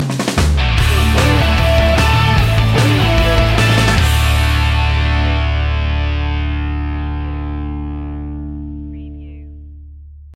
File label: w8ter boi sting